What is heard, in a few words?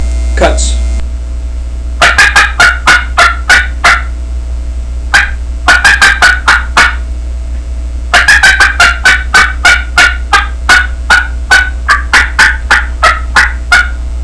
april special cutts, turkey; Southland Sounds